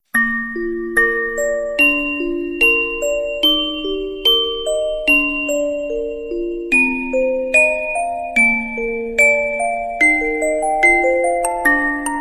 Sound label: twinkle